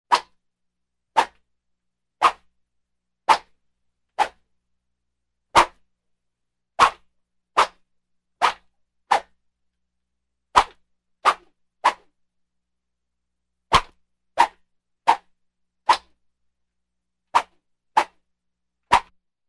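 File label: swings; Звук мечей; 20 variantov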